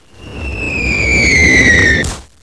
Incoming big

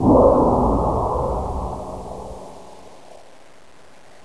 zombie attack low

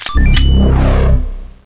crystal big

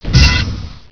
gunner jump land

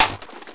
mp5sd clip in

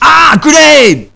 argh grenade

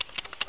rpg, weapons

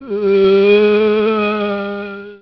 zombie die, male

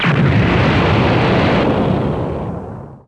kiplosion

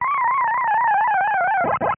pac dead

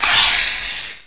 snake die

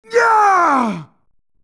mouth beam; uub

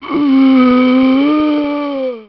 zombie idle, male

